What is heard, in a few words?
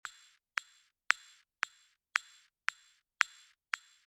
Sound Design KICK SOLO TOP